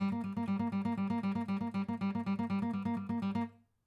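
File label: acoustic pattern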